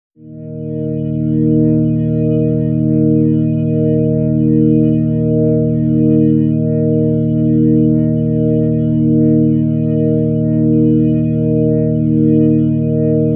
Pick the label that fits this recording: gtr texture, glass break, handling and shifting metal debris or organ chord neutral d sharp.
organ chord neutral d sharp